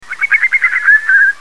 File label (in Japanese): daishakusigi